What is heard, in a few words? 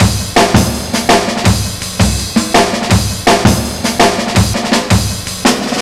165 jazz solo, Drum Loops, Drum & Bass; Total Drum&Bass